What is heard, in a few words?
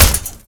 Sweetner Metal, impacts; Totaled; XBOX